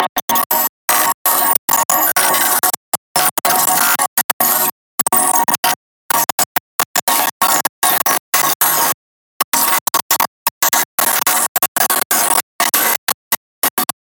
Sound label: Glitch Amb